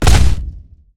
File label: mecha left